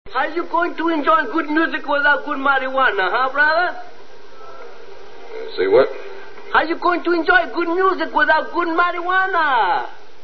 music

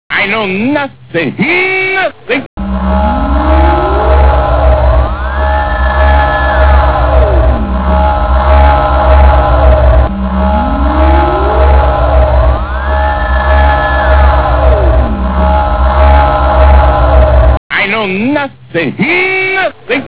saucer; german

